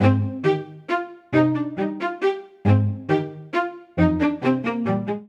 Phrase Frolic C Minor 136 bpm (3 4), String Quartet; MIDI Clips; Ableton; Factory Packs; Spitfire Audio